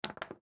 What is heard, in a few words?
Dice Two Roll, Dice Two Roll Hit Settle Felt Table; Casinos; Sports; Blastwave